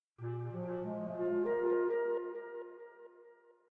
Arp; Proteus

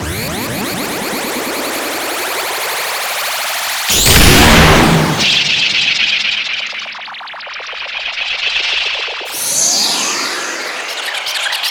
Boss Win; Pac-Man Championship Edition 2 Plus

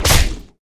Weapon Arrow Critical; Inotia 3

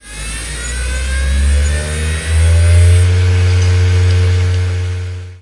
boss wake

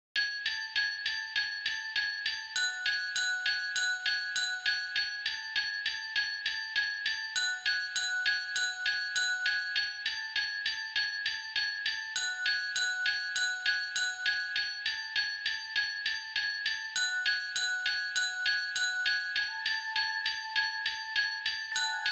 Footstep

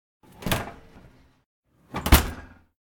Oven Close

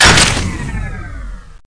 reload energy Clip Out

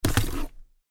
Foley Pick up torch; The 39 Steps

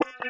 Currency Midas Flyoff

